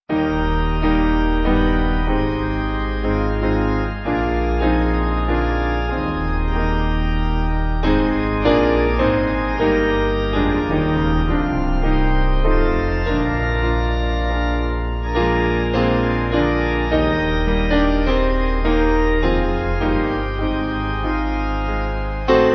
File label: Piano Organ